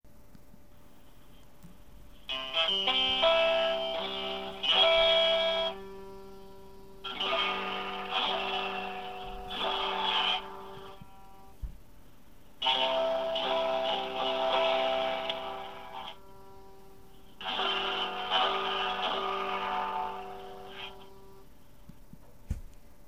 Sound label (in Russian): Neskolko akkordov